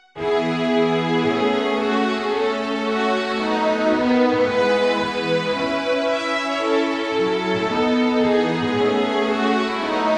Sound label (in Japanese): メロディー